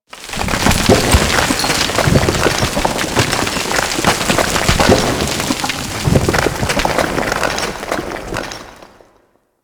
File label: Cave Sounds Random A; Warframe; Ambience; Grineer; Gnr Cavern Corridor 1